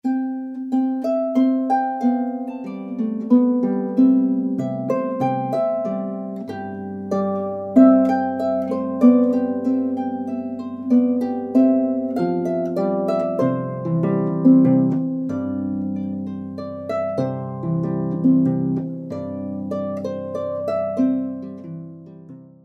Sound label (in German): Pastorel